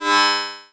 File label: Multimedia Internet CD-Rom Flash Element